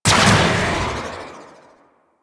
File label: Shot, Weapons; Prisma Angstrum